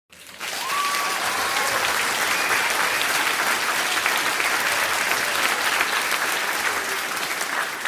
crowd clapping

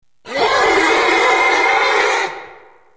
scream

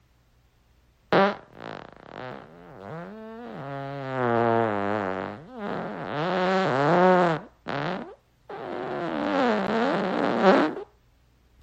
long smelly fart

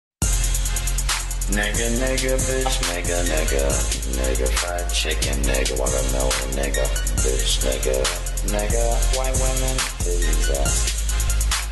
saw

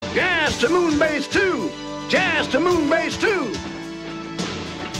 jazz

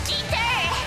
guiter

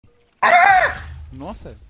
twilight forest portal fail

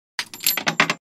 coin dropping in machine; Ficha; Ficha Jota

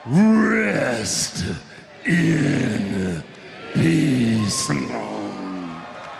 gurgle

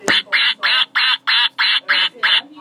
quack

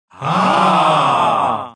"ahh", AoE2 Taunt FR; AoE2 Taunt FR 07

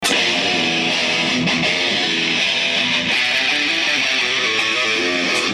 metal

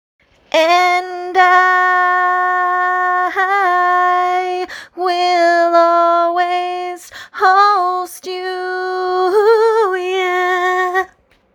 thunder

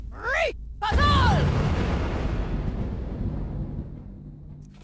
female shout

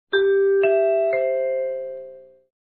chimes network id; nbc

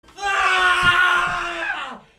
screm; pewdiepie

